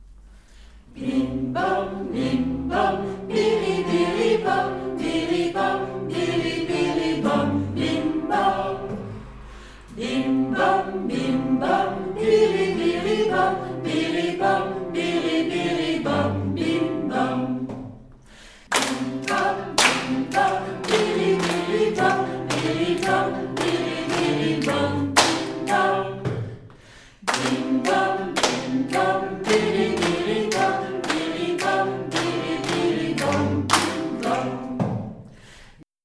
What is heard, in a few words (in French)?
phrase chantee puis chantee frappee; Phrase 1 chantée puis chantée-frappée; chanson chantée puis chantée-frappée